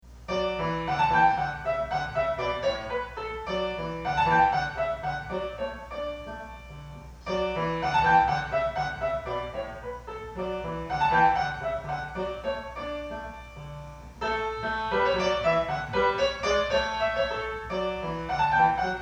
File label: Peasant Dance, piano; J.C.Bach